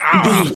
voice male hurt pain

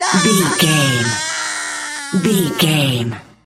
voice male death high

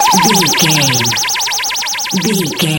retro magic spell cast

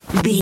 foley cloth light fast movement

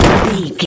rock impact small hit

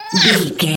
goblin fairy attack low

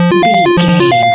retro synth beeps

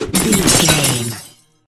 ice spell impact icicle hits

